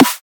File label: small metallic dnb snare